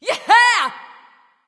nv yay; smite; awilix

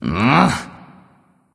"ugh"; smite; voicepack; sylvanus

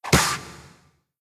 defeat hit; smite; raijin

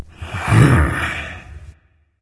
nv grunt lift; cernunnos

